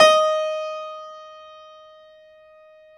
Classical Piano ff, D3; Total Piano; Brilliand Classical Piano; Classical Piano ff -ped

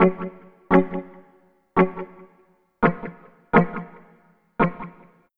Guitar, Trip-Hop Loops

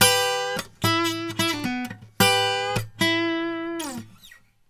Blues Guitar; ILIO; Fretworks; LICK

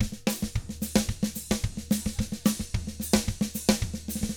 SMBA, 110 SAMBA, Drums; ILIO; Double Platinum Drums 1

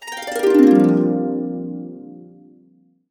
BB, Harps; USB Soundscan vol.62; AKAI

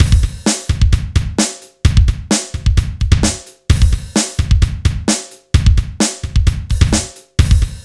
rock; INIS; Funky Xtreams

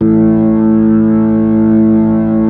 B-3, Keyboards

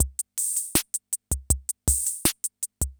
CR78; Best Service; XX Large Most Wanted

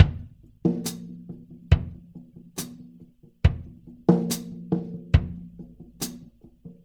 Earth Loop, Drum Tools, Laid Back

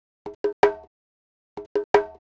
Conga, Latin Percussion

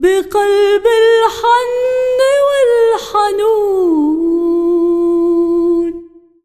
SINGER, ArabianTraditions

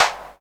DREAM CLAP; Q Up Arts; Dream Experience; DRUMS PERC

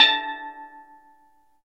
STEEL DRUM

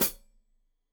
Hat Foot, Hi-Hat, Cymbals Stereo

